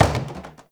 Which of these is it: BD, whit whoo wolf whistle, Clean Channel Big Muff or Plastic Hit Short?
Plastic Hit Short